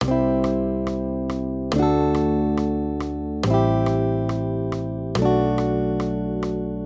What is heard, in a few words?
maj triads